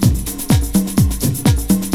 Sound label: BEAT, BREAKBEATS; Crypton - DrumBase5000